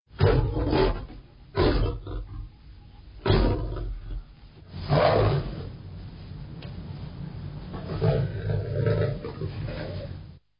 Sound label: Lion Roaring